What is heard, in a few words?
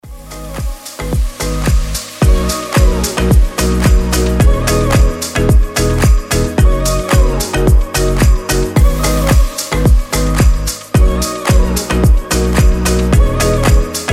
stylish house music